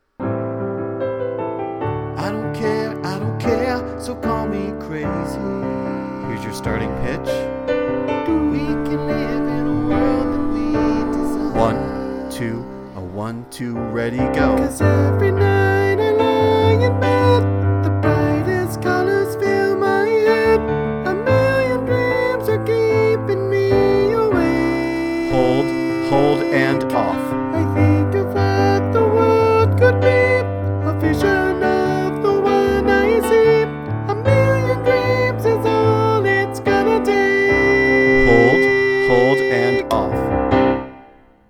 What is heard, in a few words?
excerpt soprano